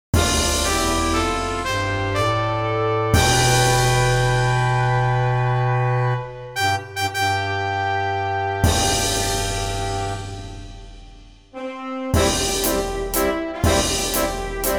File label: horse racing